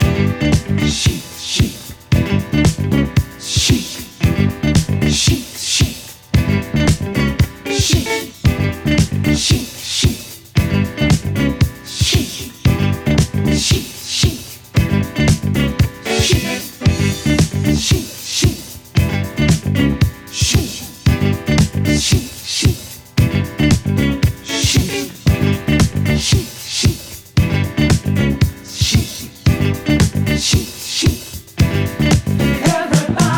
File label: cheer loop, guitar